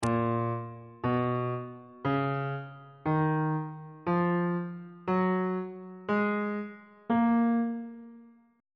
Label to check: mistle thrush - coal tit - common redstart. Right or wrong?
Wrong